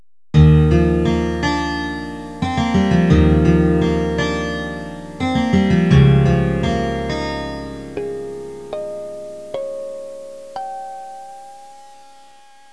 musica newage

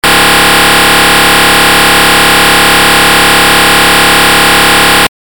suoneria beep

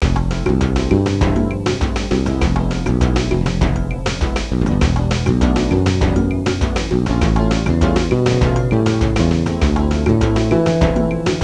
suonerie contemporanea; musica contemporary

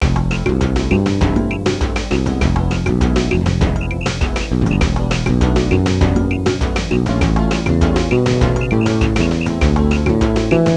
suonerie contemporanea; musica contemporary

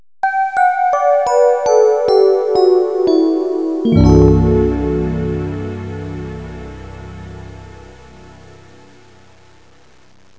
musica newage

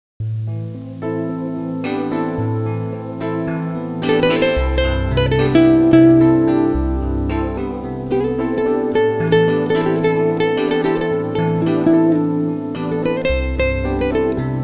suonerie musica jazz

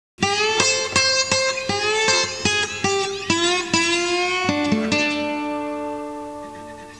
suonerie blues

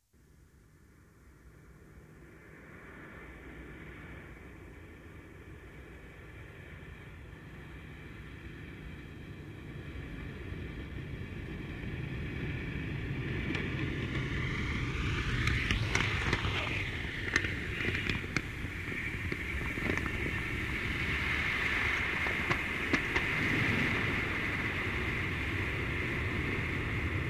TRENO PASSAGGIO; trasporti; treni

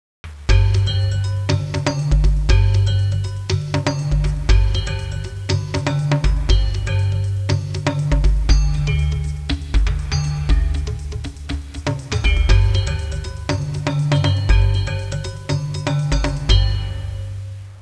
suonerie musica hawaiian

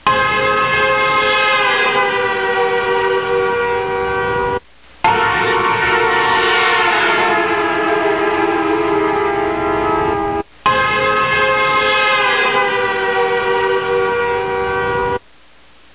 Auto Passante; trasporti

